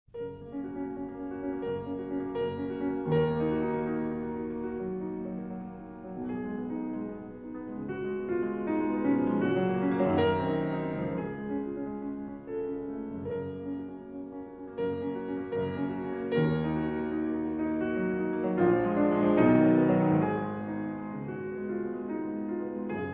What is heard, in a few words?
Impromptu In G Flat; Schubert; Impromptu 3; D 899